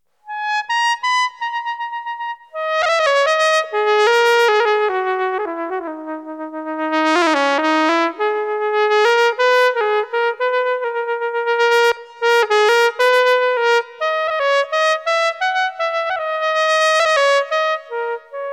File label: Picc Tpt; Piccolo Trumpet